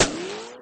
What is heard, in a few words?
bullet hurt a stone; sfx